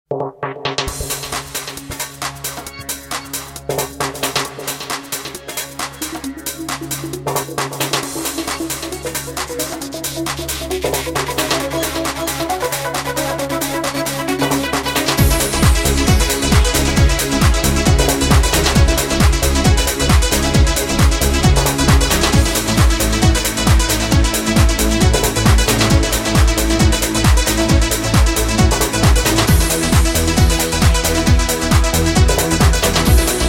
old school techno

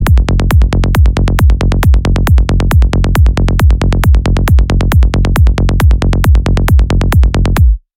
Trance

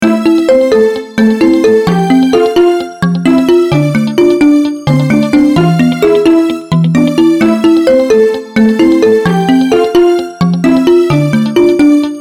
instrumental

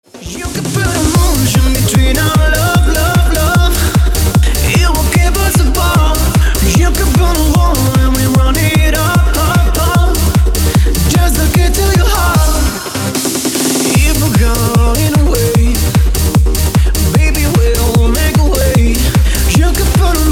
Hard EDM Workout 150 bpm

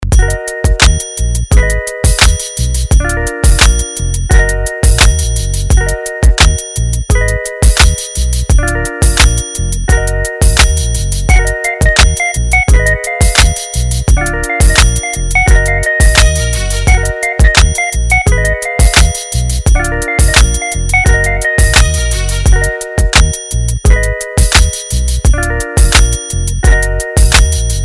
instrumental

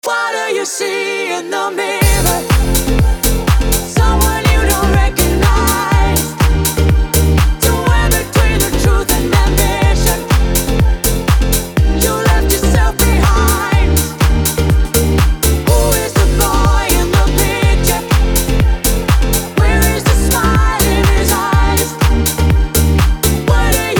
piano workout

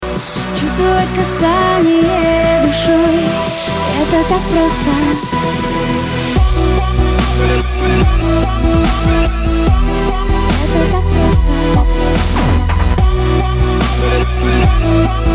dubstep

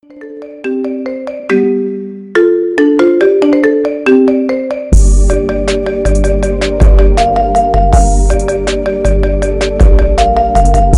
iPhone Remix

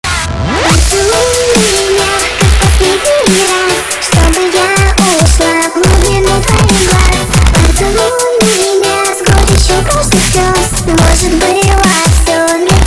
dubstep remix

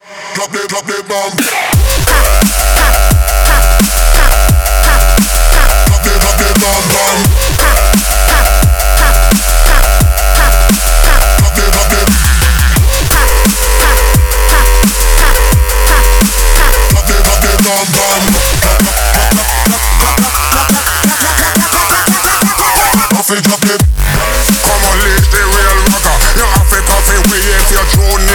dub ragga bomb

